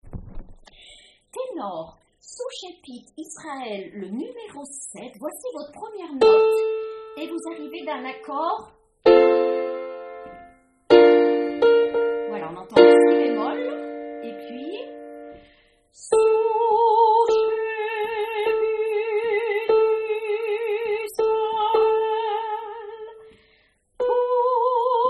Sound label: Magnificat Tenor; Vivaldi